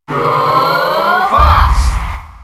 Grito del público; Fox; SSBM

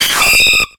Grito de Spinarak

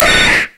Grito de Sandshrew

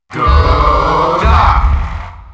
Grito del público; Dr. Mario; SSBM

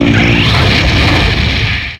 Grito de Volcarona

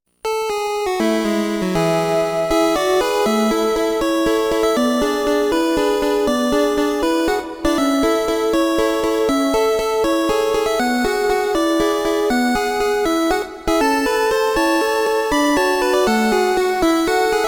Surf

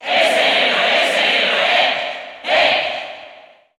Grito del público, Español; Heroe; SSBU

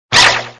Grito de Yamper

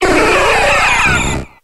Grito de Mewtwo

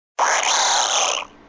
Grito

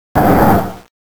Grito de Slaking

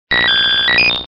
Grito

